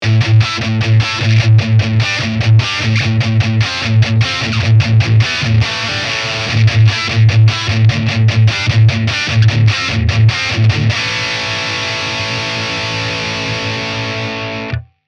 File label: tube amp doctor silencer; f.a.n.t.a.